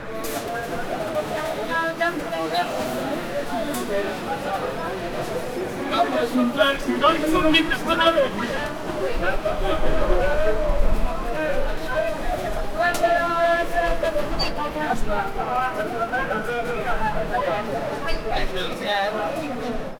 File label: create stereo ambience of, bustle